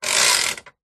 office slide projector vintage slide rack push back clicks; 1960 voigtlander perkeo; zapsplat